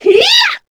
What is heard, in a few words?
succubus shout long; queen girl